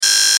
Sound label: Buzzer Button, Buzzer Button Apartment Door Bell Loop; Rings; Foley; Blastwave